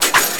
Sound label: Fanfare; SNES; Thoroughbred Breeder 3